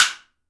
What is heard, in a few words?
woodblock; AKAI S-Series CD-ROM Sound Library VOL-5